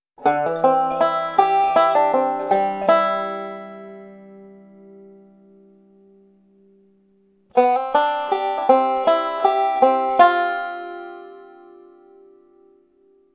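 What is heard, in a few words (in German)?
fill in licks auf c, banjo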